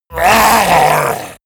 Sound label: orc scream; schreie